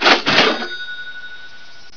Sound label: money sound; cstrike